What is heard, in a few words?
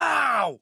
AHurt